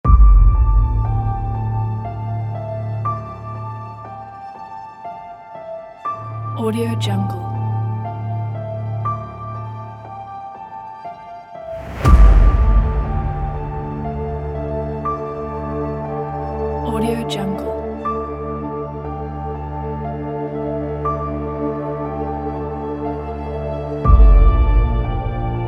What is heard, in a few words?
space future trailer